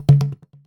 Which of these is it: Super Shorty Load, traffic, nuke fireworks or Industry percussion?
Industry percussion